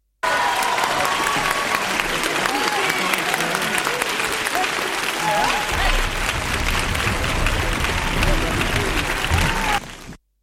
Applaudissements acclamations cris chuts

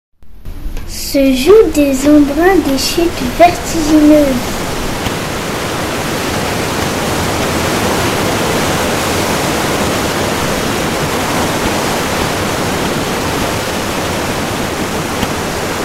montage chute d'eau

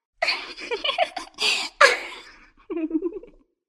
Rire

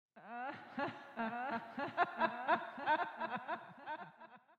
rire

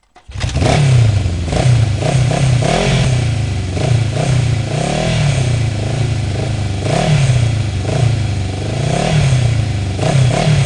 Moto

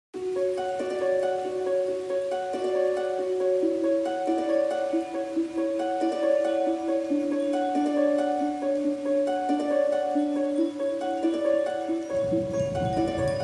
Musique libre de droits

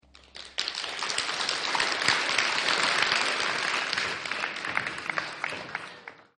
applaudissements

